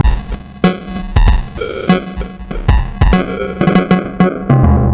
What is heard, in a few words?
97 bpm, breaks; Zero-G Beneath the Planet of the Breaks